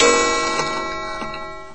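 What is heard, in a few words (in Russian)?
бой курантов с треском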